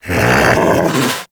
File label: Snow Critter Vocal Alerted; Hunting Critters